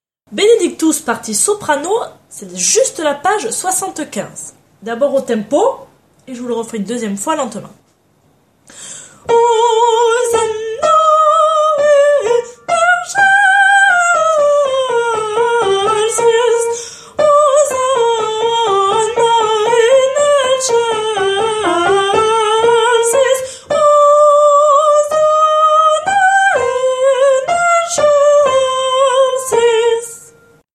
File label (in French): Soprano; Requiem; Benedictus; Mozart